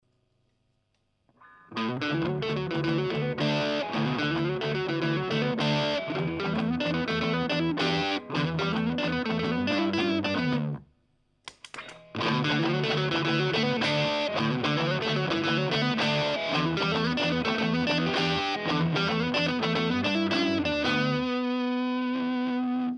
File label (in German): picking verzerrt; Aria